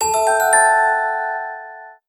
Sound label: Itemget; mercenaries blaze dawn of the twin dragons